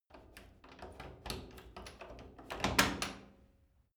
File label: wood door old lock